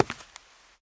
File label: walk concrete; lucius ii the prophecy